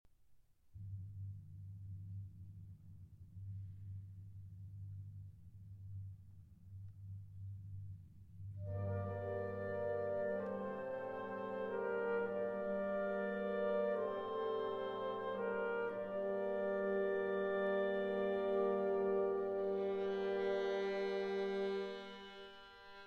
Concerto No. 1 in G Minor for Violin and Orchestra